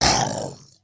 zombie grunt; zombies

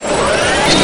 minigun wind up; tfc

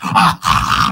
robo snort; spy

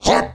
responce jump; saxtron h413

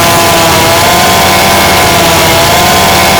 giant heavy chainsaw fire; mvm

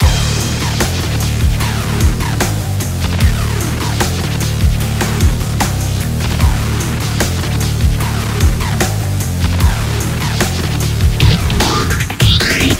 laser beam instrumental intro

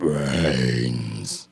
zombie groan; mvm forever

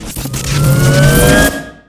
grenade emp lead in

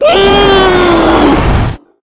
charger charge; shadows

